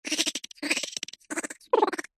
cartoon laugh high pitched snigger

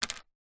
comb plastic set down on wood table; household

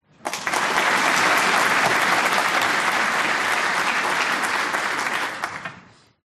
human crowd applause snooker match

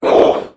Demon; little robot sound factory

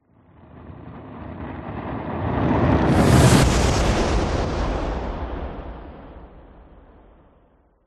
cinematic whoosh fire ball fizz; sound design; zapsplat

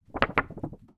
foley photo wobble

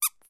toy squeaky squeak single

